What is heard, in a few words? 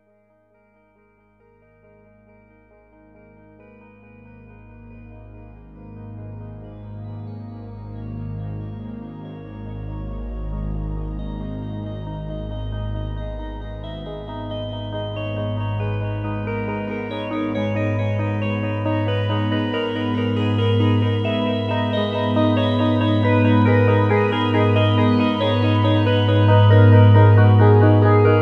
Solaris Pad Solo Voice, synthesizer